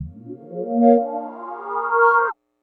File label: Fresh Flutey, Synths; Sonic Foundry (Sony Creative Software); Modular Electronica